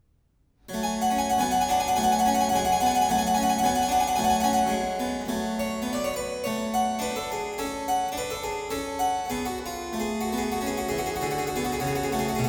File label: The Well-Tempered Clavier, Prelude No. 16 in G Minor; Book 1; BWV 8611; J.S. Bach; Das Wohltemperierte Klavier; The Well-Tempered Clavier, Volume 1